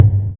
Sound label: Tr808 tom lo